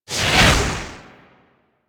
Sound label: Tengu dive light; Warframe